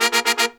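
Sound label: Pop & Funk Brass, 110 Bb, right; Best Service ProSamples vol.25; AKAI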